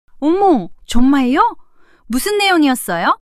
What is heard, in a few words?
surprise